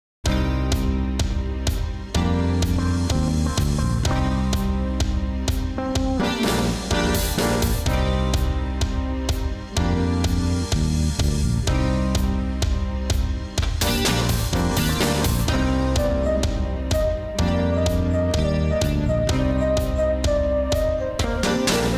Outdoors; Dark Sun Wake of the Ravager; ms dos

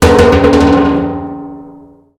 metal Jaw Debris

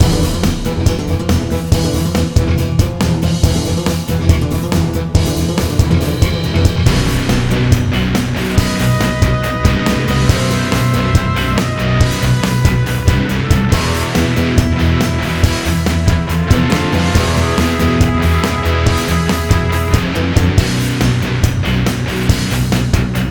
Attack Mexican

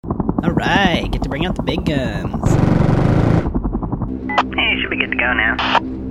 Gatling Gun; Infiltrating The Airship

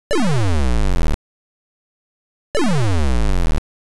Enemy Aircraft Missile; Oretachi Game Center Zoku; Time Pilot

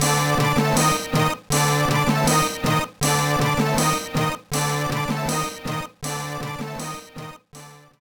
BGM; Jissen Pachi-Slot Hisshouhou Juuou Advance; gba

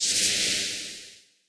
Btl Actlrng Powder; The Denpa Men; Android

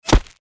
bullet impact dirt; Out of Reach: Treasure Royale

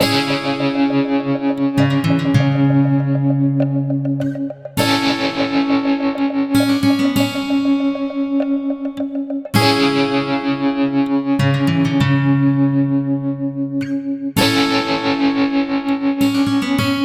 Stinger Mexican Long

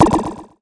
tap blip, mono; dokobots

